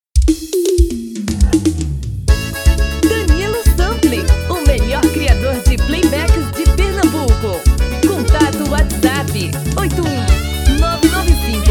Forro das antigas

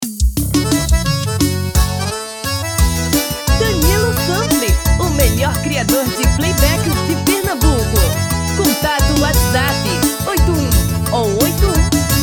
tom masculino

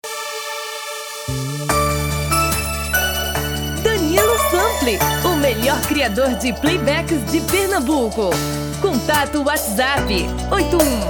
Brega

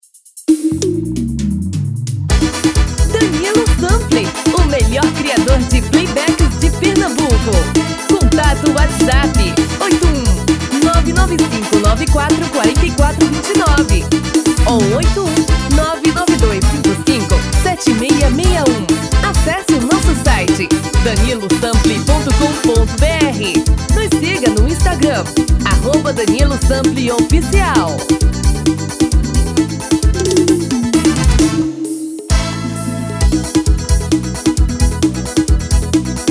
Forrozao

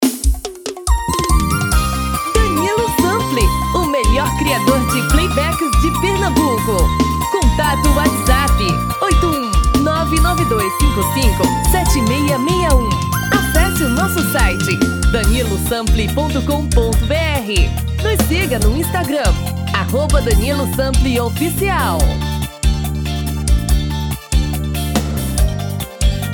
BREGA

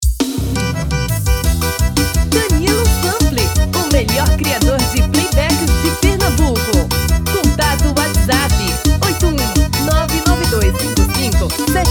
tom feminino

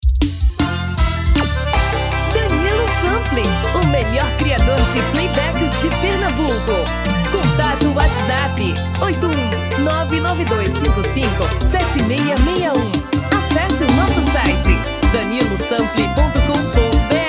Forro; um tom abaixo

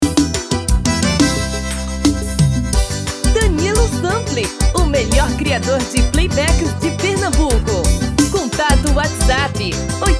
feminino

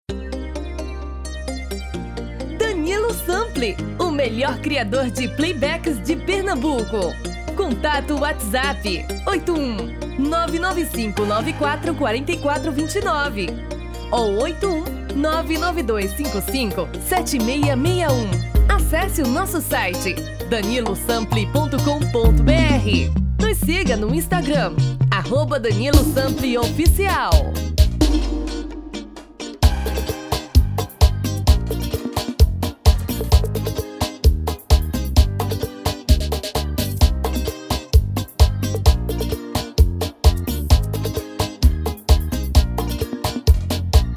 Bregadeira

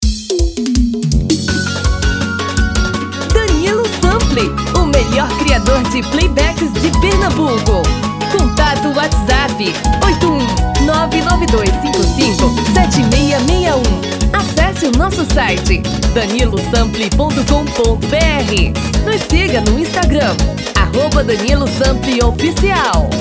ELETRICO

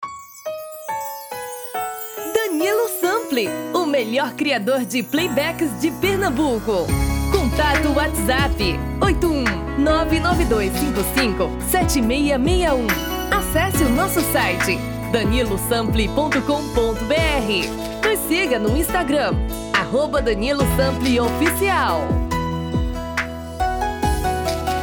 BREGA

